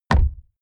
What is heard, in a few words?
horse step wood